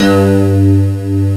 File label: KYSTWOG1, keys; D70 KEYS